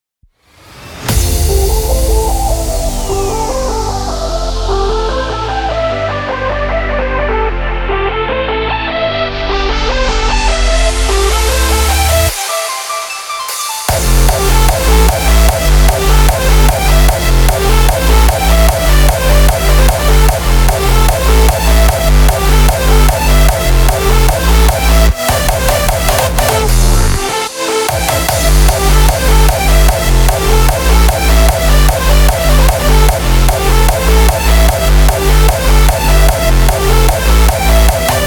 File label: Hardstyle Template